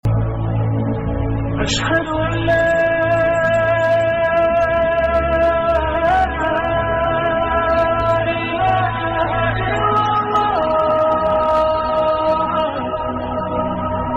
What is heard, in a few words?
azan alarm ringtone; islamic